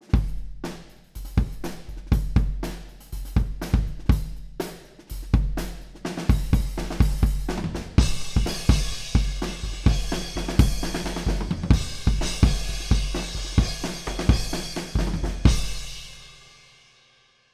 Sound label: amb pa kick outside u47